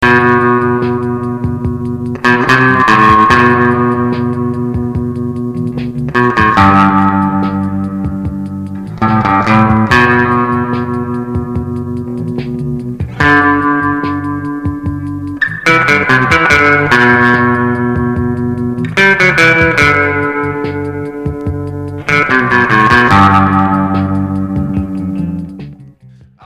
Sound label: danelectro, guitar